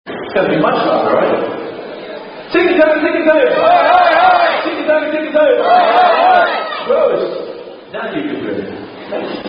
Zicke Zacke Zicke Zacke Heu Heu Heu! [Ziggy Zaggy Oy Oy Oy]